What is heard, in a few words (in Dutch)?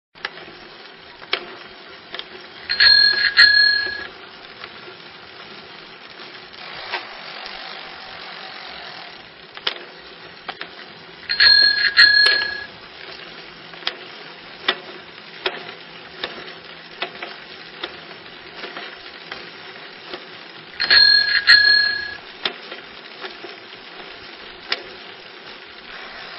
Fiets